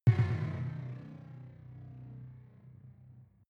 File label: sci-fi vehicle engine stall; raumschiff